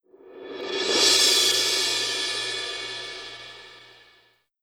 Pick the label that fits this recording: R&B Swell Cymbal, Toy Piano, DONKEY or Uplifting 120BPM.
R&B Swell Cymbal